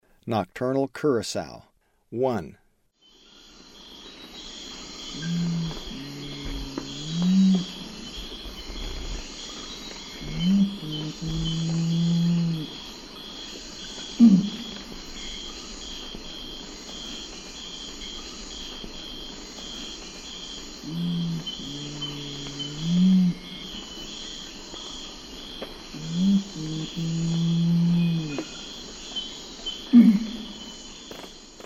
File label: Nocturnal Curassow, Birds of Ecuador; S. America